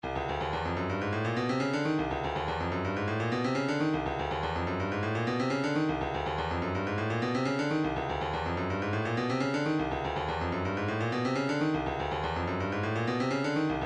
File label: 123 shaker; Thumper